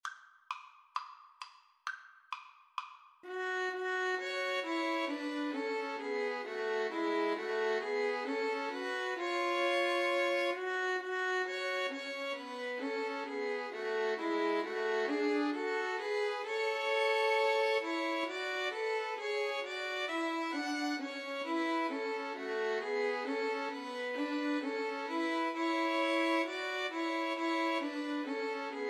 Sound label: god rest, 2 Violins Cello